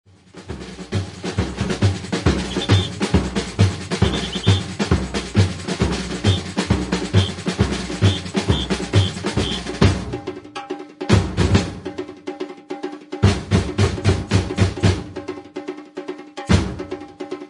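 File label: Break, Batucada samba; מעבר 2 עליון בקצב בינוני מעבר 1 וחזרה לקצב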